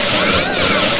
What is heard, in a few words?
fire wing; DARKOMEN